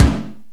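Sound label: kick rock, FM drums; East West; Global Sounds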